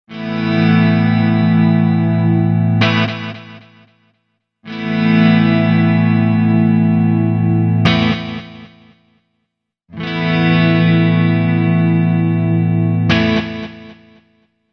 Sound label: Eventide Ultratap Delay